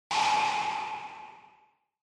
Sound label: Single Accent High Short